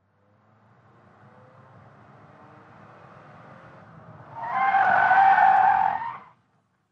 Sedan 180 Rev Skid Mx

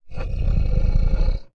CREATURE GROWL

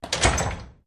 Elevator Gates Doors

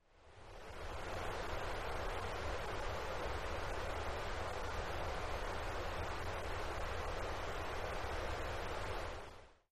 Fan Kitchen Stove; fans

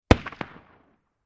50 Cal Pistol Shot From Distant Point of View

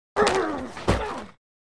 Football Hits Grunt

